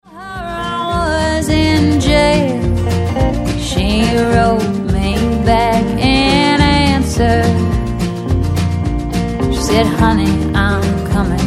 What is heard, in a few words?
Country Blues